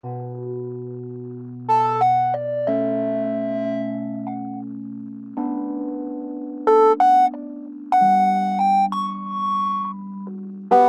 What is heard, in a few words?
Bm 90 Piano Chords Impro